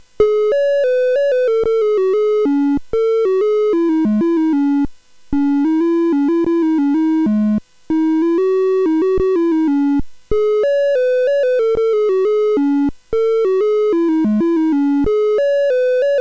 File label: sid 8580; CSG8580R5; Magic Stone